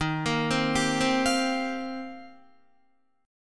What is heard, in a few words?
Eb 6th Add 9th, Guitar; arpeggiated